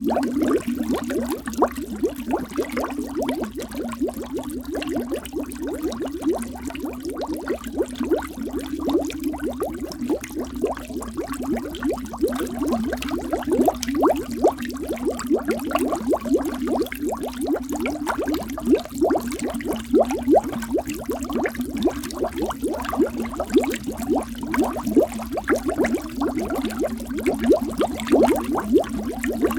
water bubbles two foot deep

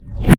Swell; bottlerocket

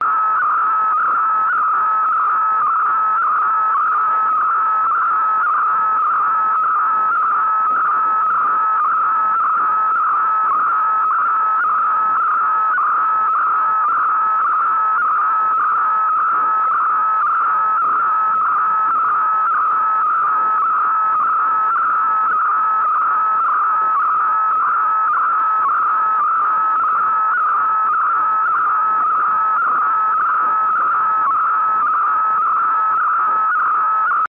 science fiction data stream harsh loop; skyclad sound

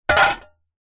industrial shovel metal set down concrete ground

